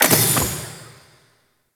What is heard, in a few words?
Galleon Jail Door Open; Grineer